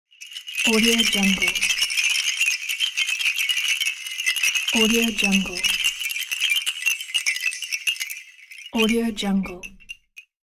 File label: wind chimes wood